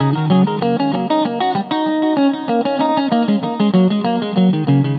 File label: durga, stringed instruments; Sonic Foundry (Sony Creative Software); World Pop